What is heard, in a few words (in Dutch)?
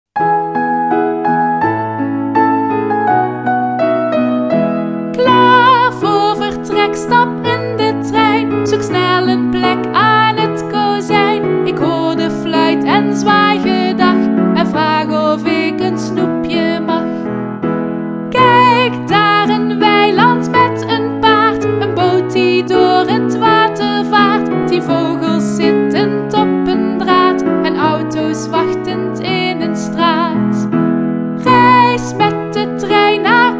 naar omatoe met zang